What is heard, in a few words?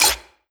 cursor song; ExperimentalGear